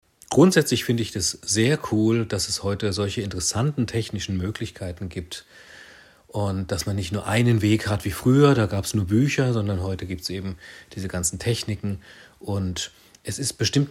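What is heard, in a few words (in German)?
klavier antwort, piano answer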